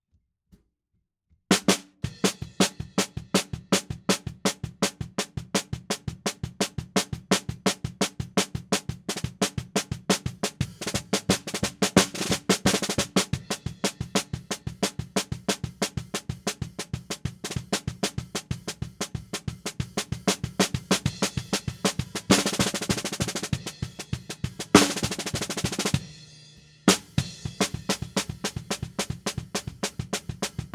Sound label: SNR BTM